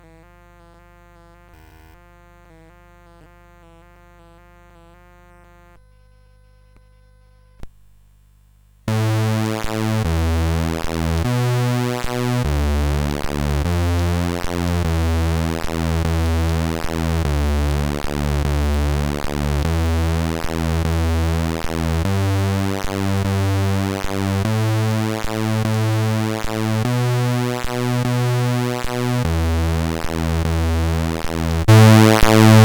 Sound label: Thunderstorm, CSG8580R5